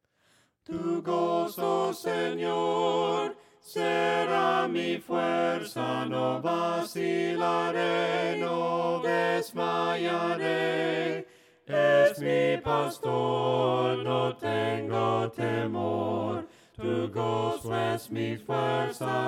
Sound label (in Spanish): VOCES, himnos a capella; cantos del camino